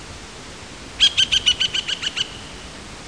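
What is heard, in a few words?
woodpecker melanerpes striatus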